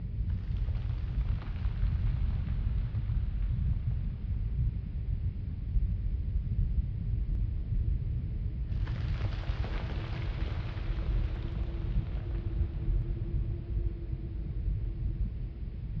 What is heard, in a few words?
cave ambient; CrossCode; background